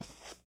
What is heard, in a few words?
Foot Carpet Shoes Walk; Lucius III; pc linux